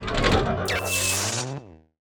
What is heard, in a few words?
bot lift arm charge; mine; battle